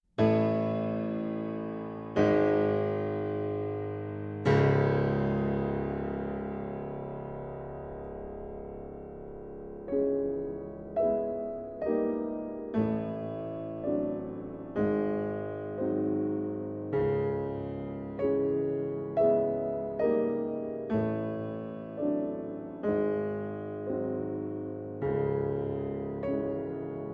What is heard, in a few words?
Prelude in C-Sharp Minor; 5 Morceaux de Fantaisie; Op. 3; The Bells of Moscow